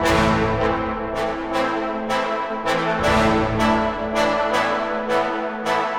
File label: Brass Ens stacc; духовые инструменты